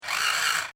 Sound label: Servo Mechanical SciFi Robot Toy, Scifi Servo Mechanical Robot Small Motor Toy